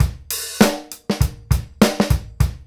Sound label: groove, Grooves 99-132bpm